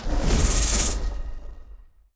wild snake; rise of maya

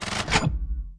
quick Stop Bounce; wildotron 3000

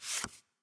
Split, blackjack

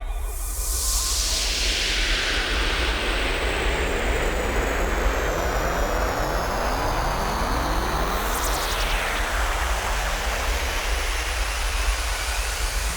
extreme Selected; starburstxxxtremeusa

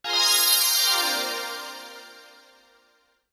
pre respin; spinsane

